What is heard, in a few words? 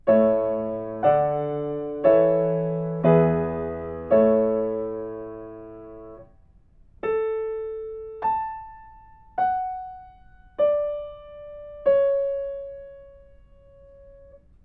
melodic fragments